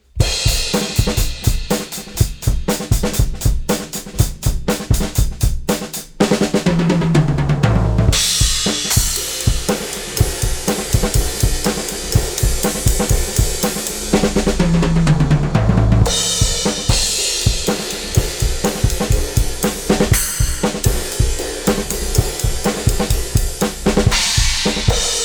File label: drums